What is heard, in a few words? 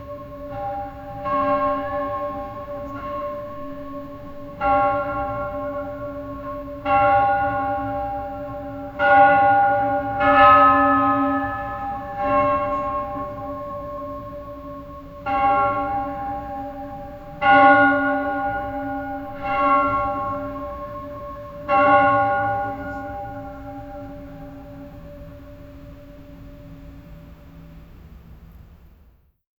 heavy church bell ringing, reverberating